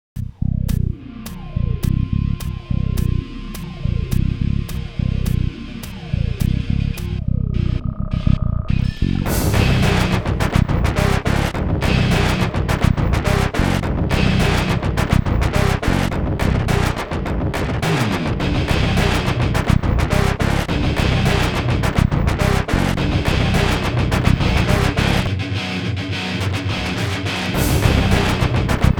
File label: Hard Technology Rock